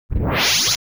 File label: haste on